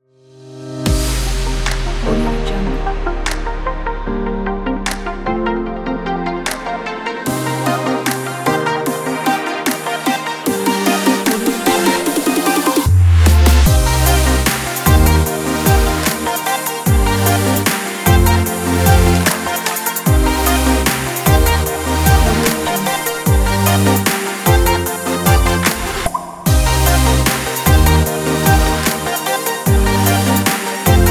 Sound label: future bass